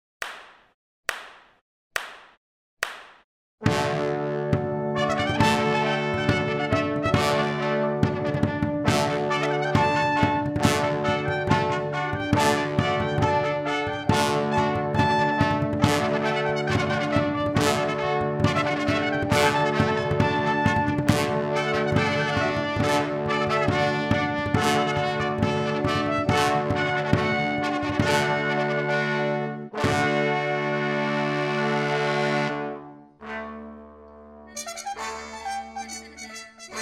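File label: toccata, orchestra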